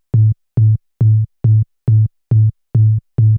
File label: and bass, Techno 138 BPM; WOBBLER